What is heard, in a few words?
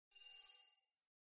HF Elect Pulse Shimme